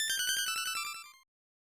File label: Item Chime; Star; Flintstones The Treasure of Sierra Madrock; SNES